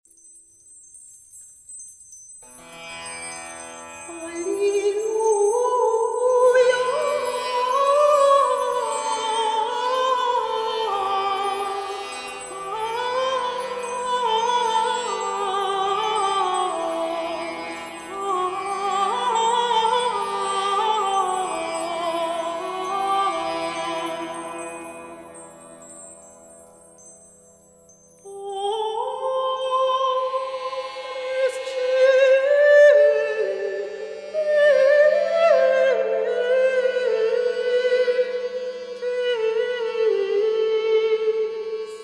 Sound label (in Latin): alleluja omnes gentes